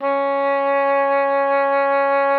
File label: C#4, OBOE; Xsample VOL-6